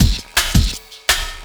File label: Beat, Futurist Drum & Bass; Drums & Percussion